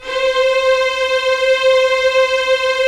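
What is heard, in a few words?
Strings Violins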